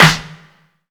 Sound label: large room steel snare drum sample D# key